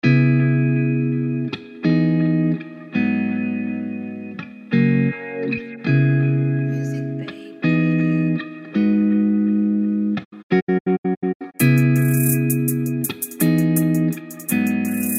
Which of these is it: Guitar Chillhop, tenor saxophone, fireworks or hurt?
Guitar Chillhop